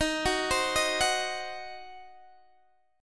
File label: Eb Minor 6th, Guitar Open D